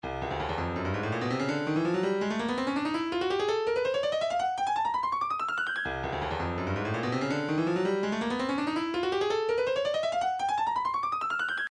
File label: 165 djembe